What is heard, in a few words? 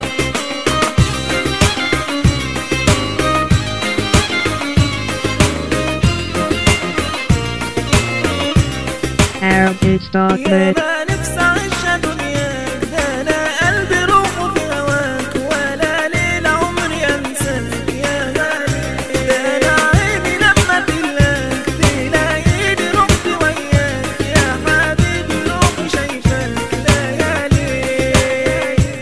yama yama blues